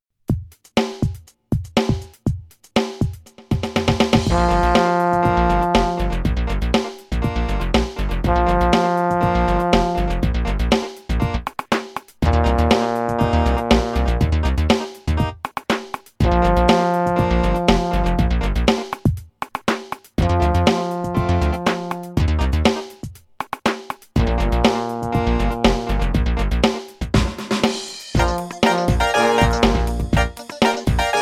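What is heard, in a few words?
Pitching Game Trombone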